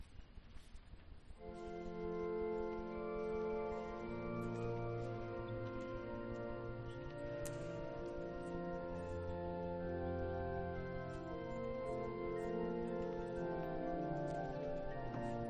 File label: Preambulum F-dur; Heinrich Scheidemann